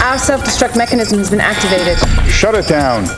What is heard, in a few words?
shutdown